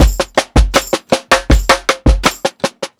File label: PICCOLO MARCHY, breaks, drums; Basement drums vol 2